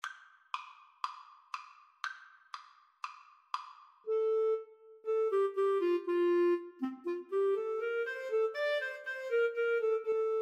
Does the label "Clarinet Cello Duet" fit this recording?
Yes